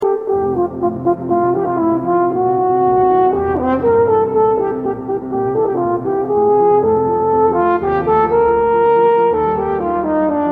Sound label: Horn Sonata; Музыка Классицизма